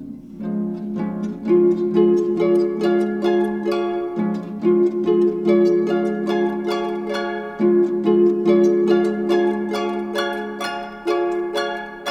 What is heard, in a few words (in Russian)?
arfa; Звучит третий инструмент